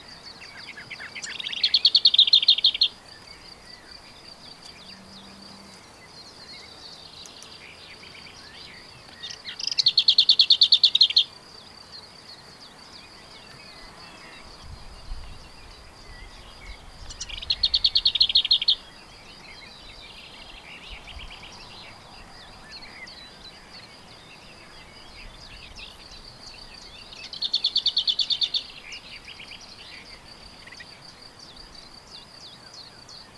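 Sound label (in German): Klappergrasmücke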